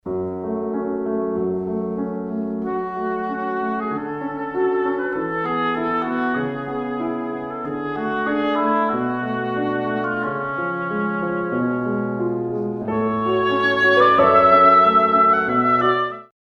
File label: pavane oboe opening; faure